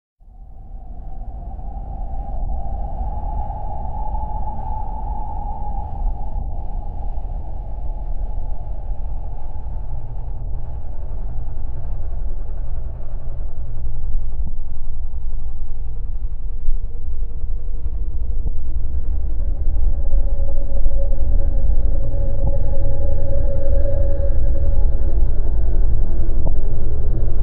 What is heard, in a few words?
Cosmic Noise; ai social media music